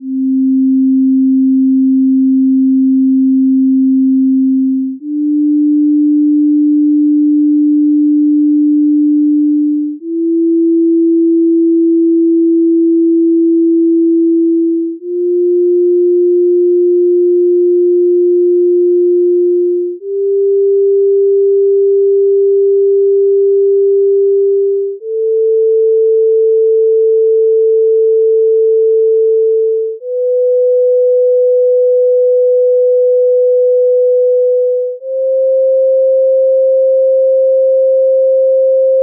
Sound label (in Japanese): 正弦波1本による音階; 付録D：音階・音律; 各種音律